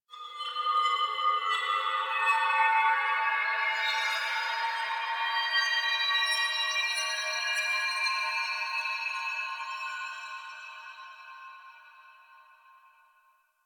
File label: Chime Transition; Mission Four; Warframe; The New War